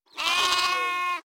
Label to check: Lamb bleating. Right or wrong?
Right